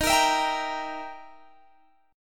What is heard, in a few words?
E Minor Major 7th Flat 5th, D sharp